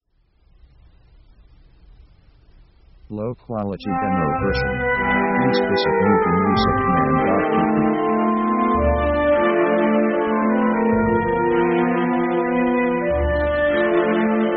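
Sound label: orchestral romantic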